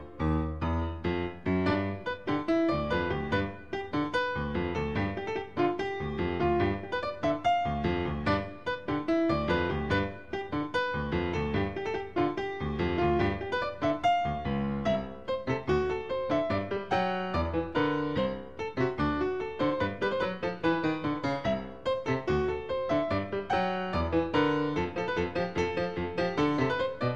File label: piano loop; ringtones; games